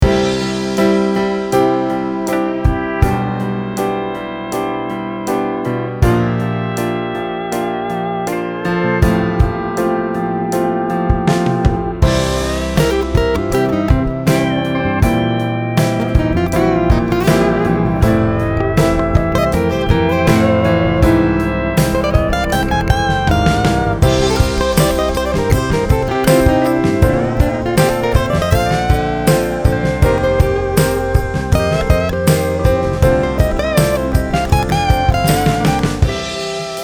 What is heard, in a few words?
drum; retro drum